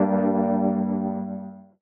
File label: C Keys, One Shots; Keys & Synths; Touch Loops; BlissedOutBeats